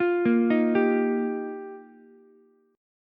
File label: G Minor 7th Sharp 5th, Ukulele; Gm7#5; arpeggiated; JGuitar